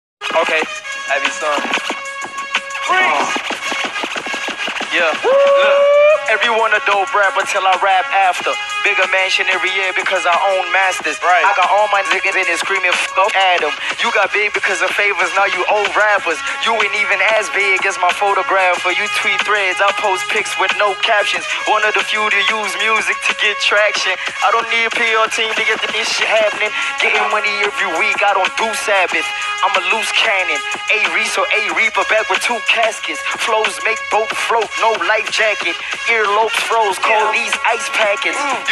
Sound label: Hip Hop Cypher